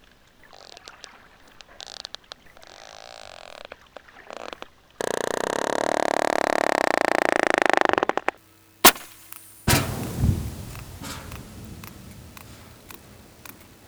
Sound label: sperm whale sounds; Project CETI